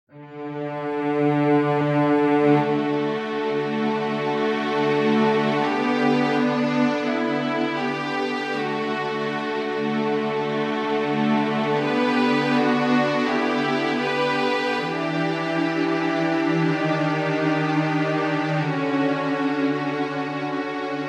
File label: Howling Stone -; Legend of Zelda, The : Twilight Princess